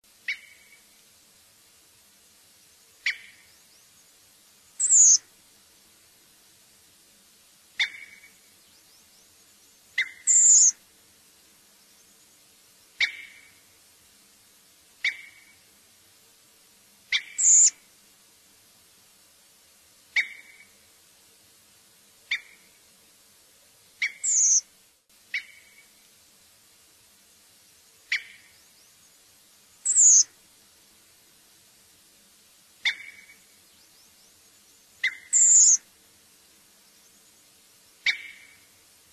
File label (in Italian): SASSELLO; CANTI SAG NATURE 2024